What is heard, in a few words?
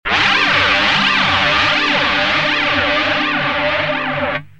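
guitar dod r870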